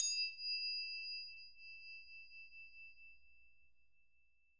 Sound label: Bell L, BELLS; Roland MV8800